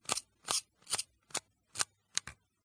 smena symbol camera shutter speed dial; dpa4060; PMSFX

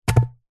household blender lid close

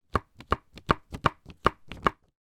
office crayon dab on paper several times

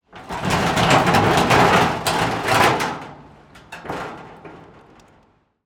metal wave panel gate opening; london music mixing

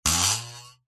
cartoon metal ruler twang wobble

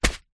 food bag of rice set down on surface; zapsplat

